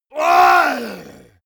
monster growl attack throaty; schreie

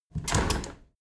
window handle squeak turn open heavy; objekte; fenster

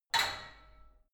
barbell only lift up hard, fitnessstudio

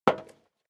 step leather shoes metal dry single right; schritte

